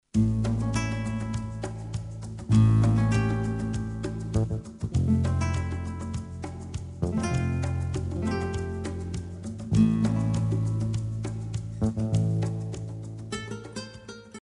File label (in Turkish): santral fon muzigi